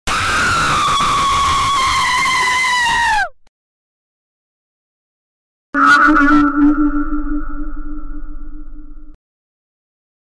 scp horror sound effetti sonori scary sounds halloween sounds